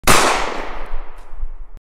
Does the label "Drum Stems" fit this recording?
No